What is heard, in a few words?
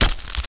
dem hit; DAoC